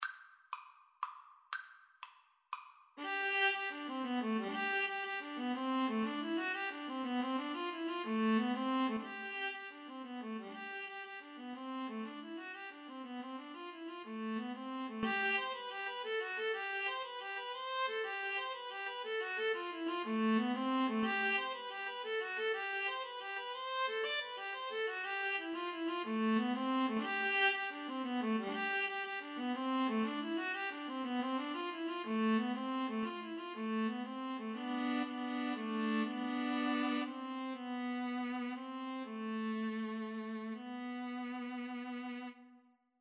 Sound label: blast of wind, Viola Cello Duet